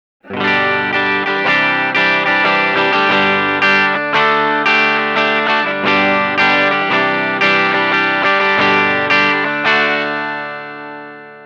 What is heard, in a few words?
od mixer